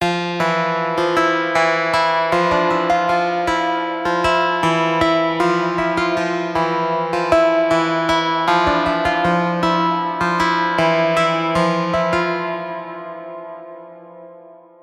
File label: ring; AlgeRNon